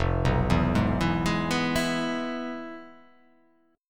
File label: F Minor Major 7th Flat 5th